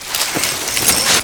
player rope; gulag; evac; Call of Duty Modern Warfare

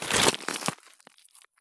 stop gravel; Escape from Tarkov

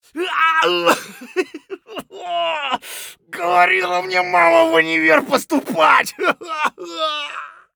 hurt near death; Escape from Tarkov; scav4

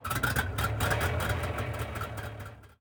start engine, engines; World of Tanks

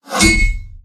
tank hit small interior

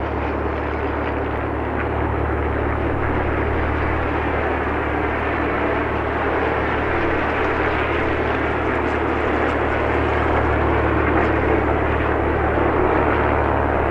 warfare ambient planes high; War Thunder

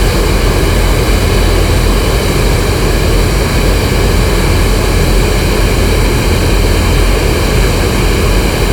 Aircrafts L-39 AI25 Back; DCS

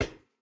footstep rubber boots metal run; Rust

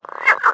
frog; World of Tanks Blitz

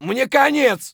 hurt near death; Escape from Tarkov; scav2

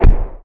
combat atmosphere; World of Tanks

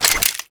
pickup ammo

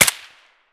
sks fire silenced close; Escape from Tarkov

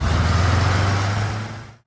engines; World of Tanks

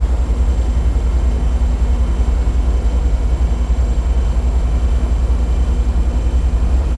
ship engines; World Of Warships

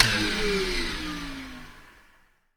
Aircrafts FW-190D9 DC AC Transformer End; DCS

